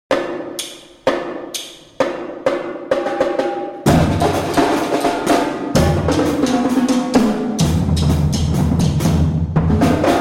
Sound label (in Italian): Cadenza percussioni; Cadenza delle percussioni in sfilata